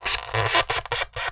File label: Polystyrene Squeak